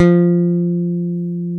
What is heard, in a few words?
F-3 pick, pick bass; AKAI S-Series CD-ROM Sound Library VOL-7